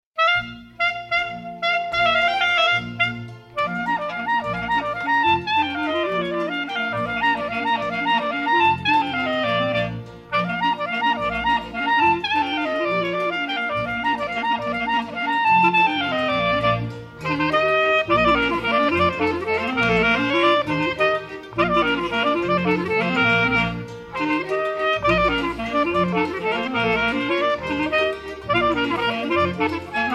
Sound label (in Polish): Kapela oberek